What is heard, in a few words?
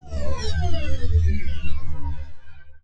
Space Ship Fly By